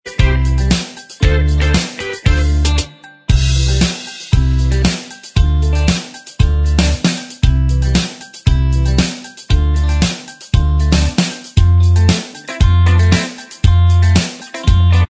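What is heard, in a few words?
instrumental Track, backing tracks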